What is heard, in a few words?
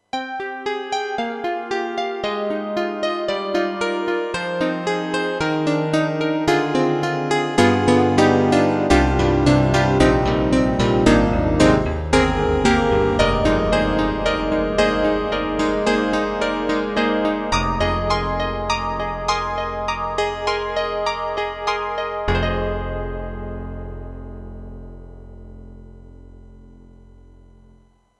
YAMAHA MU500 Layer CP2